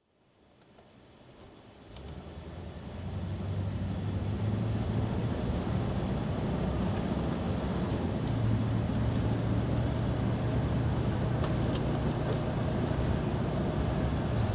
rolls silver shadow drive

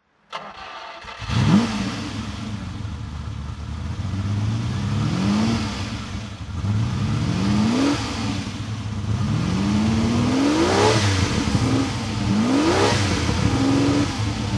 ferrari 330gt blue throttle

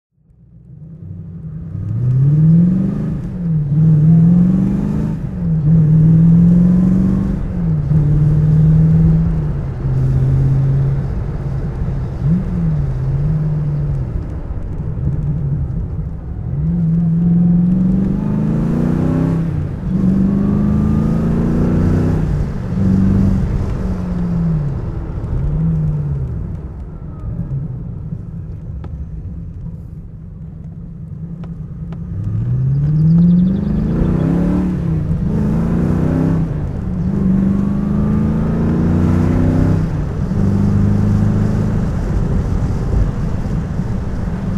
tvr chimaera 500 driving

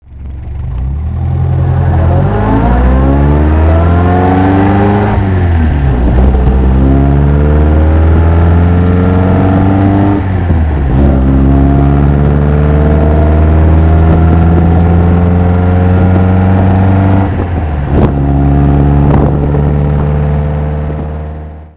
mgtd drive

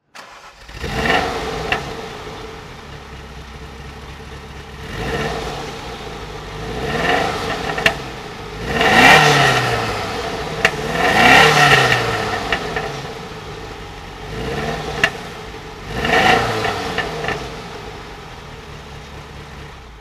ferrari 308 QV throttle

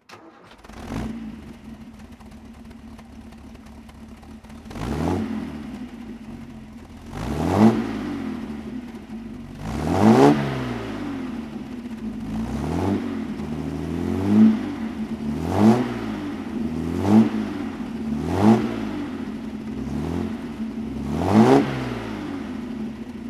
healey 3000 mk3 throttle

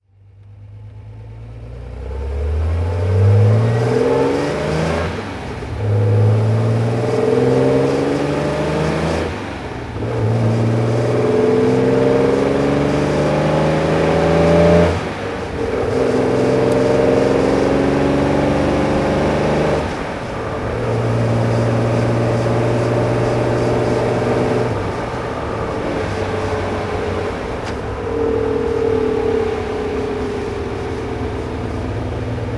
Healey special red driving

